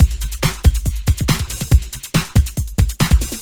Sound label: Loops BB 140, BreakBeats; Vengeance Samples